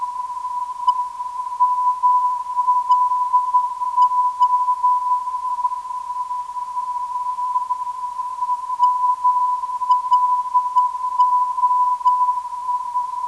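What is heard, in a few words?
378kHz, non-directional beacon; MLO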